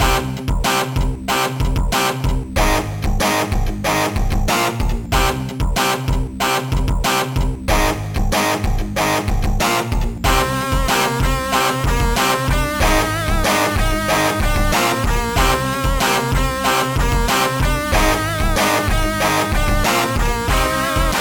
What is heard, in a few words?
guitar theme; DEATHSTR